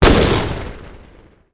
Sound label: drone explode